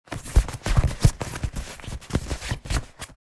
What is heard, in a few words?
Inflatable armbands handling friction